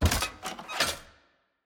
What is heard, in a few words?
Pipe Insert